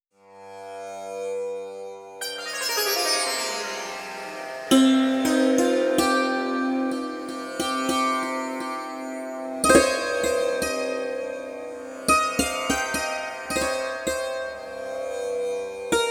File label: Indian Classical; kontakt sound pack